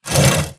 Stalker Damaged Crawlscrape Concrete; Titanfall 2

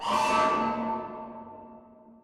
Prop Warehouse Cargo Elevator Down Alarm; Titanfall 2; Boomtown

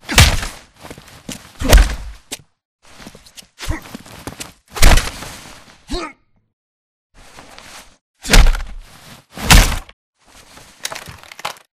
brawl; Titanfall 2

